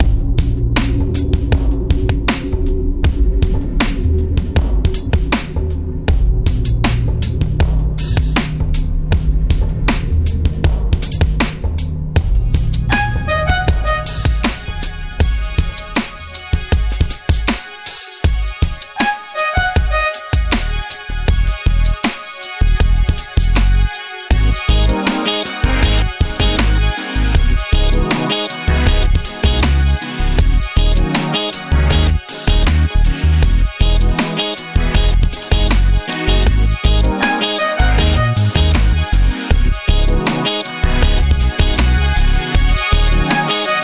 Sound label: Glitch DRY